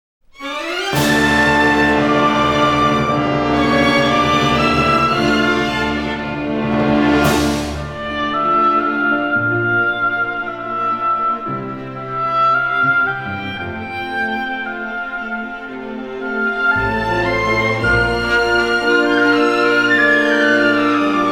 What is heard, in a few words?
the powerful motor rhapsody for orchestra